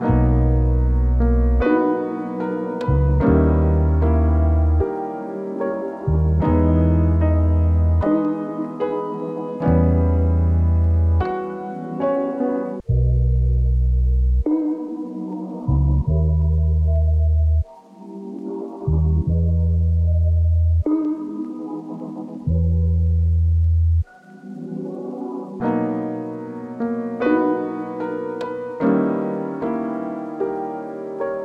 75 BPM B Min; Cymatics; Zodiac III Beta Pack; Zodiac III Production Suite

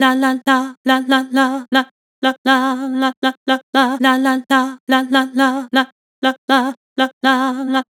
Vibrato "Lah", Harmor Vocals

